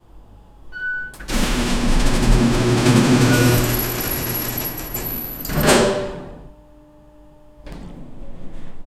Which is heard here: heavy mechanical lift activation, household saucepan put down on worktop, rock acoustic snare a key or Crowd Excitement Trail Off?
heavy mechanical lift activation